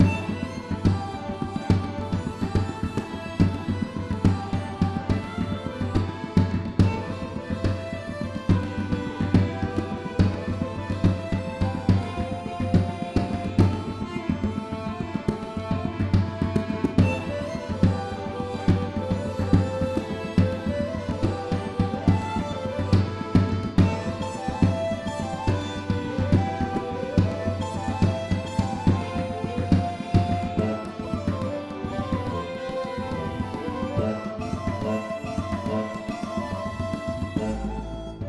pub; Shadowhand; macos windows gamerip 2017

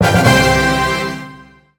notice reward

